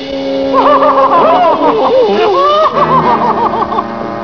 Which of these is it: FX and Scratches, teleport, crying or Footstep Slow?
crying